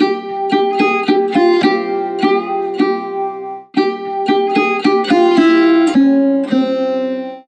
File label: guitar